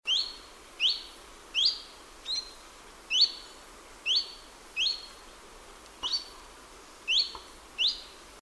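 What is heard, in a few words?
Chiffchaff (Phylloscopus collybita); Spithami, Noarootsi, Läänemaa, Estonia 5.9.2004; Typical call for this year (and for several other years too) in Estonia and Finland; Linnut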